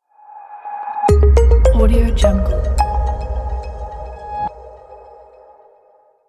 minimal logo

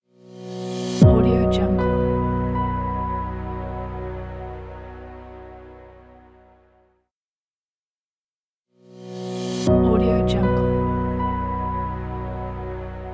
corporate logo pack

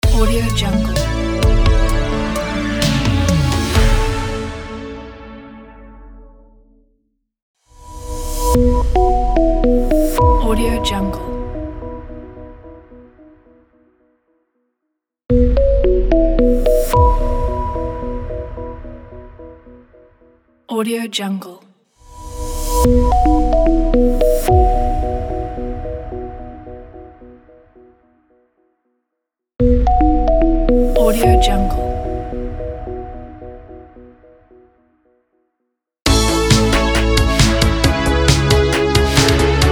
retro synth 80s logos pack

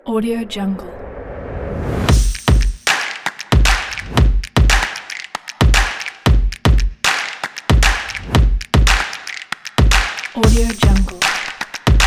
stomp opener

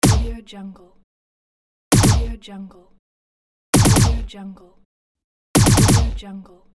futuristic weapon

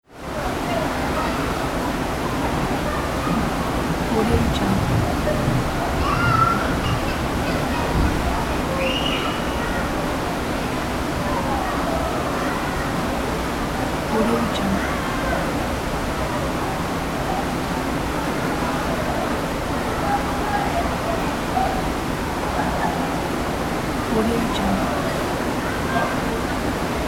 waterpark ambience; mountainaudio

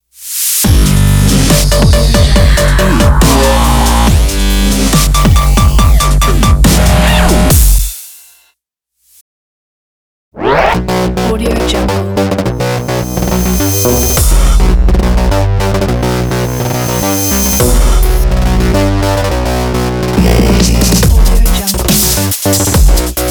edm logo pack

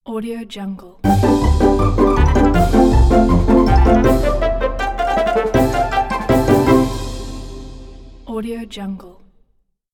funny cartoon logo

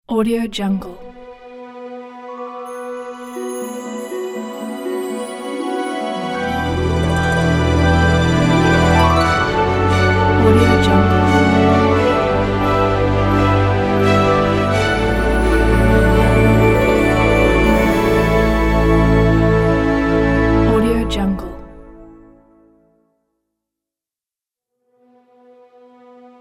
orchestral intro logo

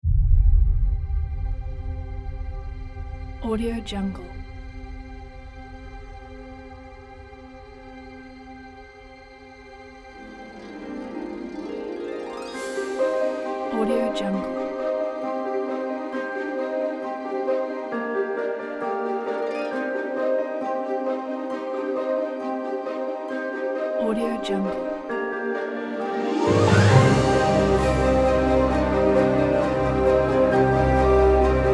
fairytale trailer

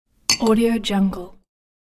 glass

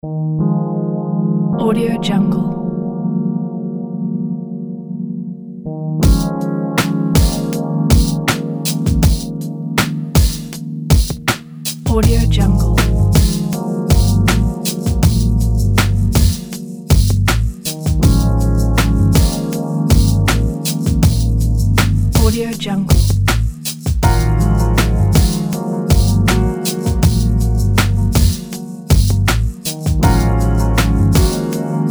soulful lounge

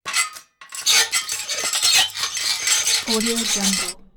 wrought iron scrape; prosoundfx

